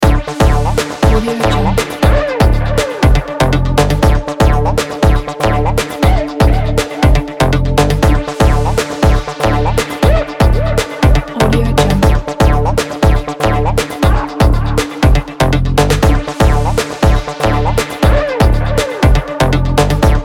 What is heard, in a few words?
seamless electronics